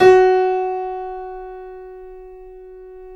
KEY, Steinway ff; Steinway M

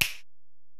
Ac Perc, Drum Machines Alesis DM-PRO

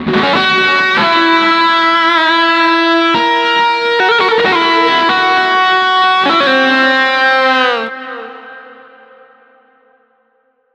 guitar, stringed instruments

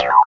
FX EFF, ANALOG; Sound & Vision - Gigapack II

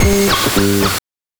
BANK2 SFX, NES DPCM; MIDINES

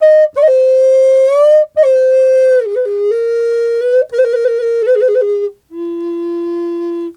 F wild flute; Big Fish Audio - Didgeridoo and Other Primitive Instruments

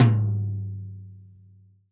TD-20 Tom A, Roland TD-20 Toms

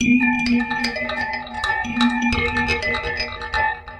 Mbira Multiple, Acoustic Beats; Sonic Foundry (Sony Creative Software); Myths of Technology

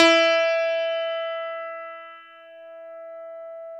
PNO, Electrics, Grands

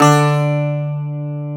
Greek Bazouki D2; Planet Earth; Digital Sound Factory Planet Earth

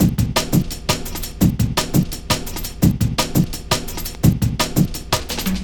Break, Drum & Bass, Beats & Breaks; Fluid Dynamics

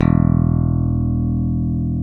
ELECT BASSE, FINGER BS

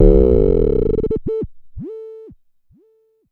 vermona drm1 mk3 fx

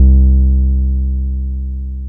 TB303 SQR, ROLAND TB-303 BASS; Rubber Chicken Software Sample Bank

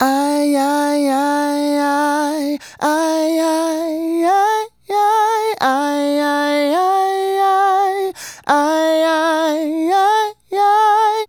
Male Solo, Hip-Hop and RnB Vocals

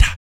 VOC PERC, Vocal Planet Jazz FX BASS PERC

